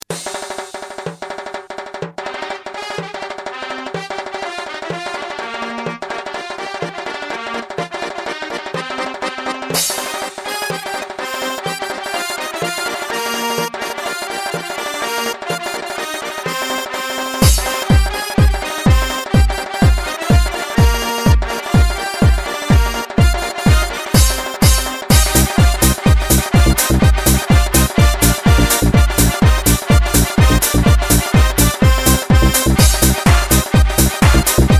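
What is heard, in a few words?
lezginka, Georgian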